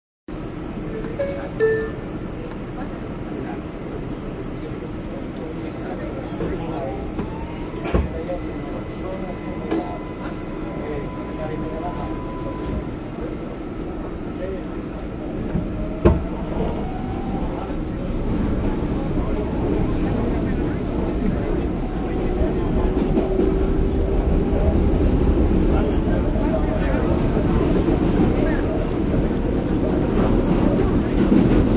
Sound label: Inside subway train during ride; Subways